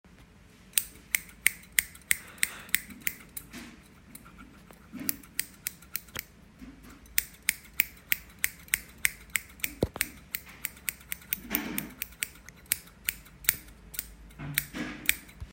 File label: Scissors